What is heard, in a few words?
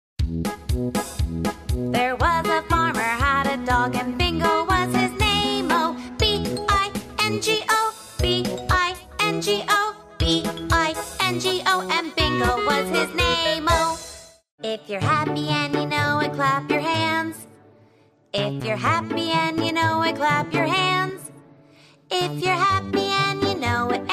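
American, Songs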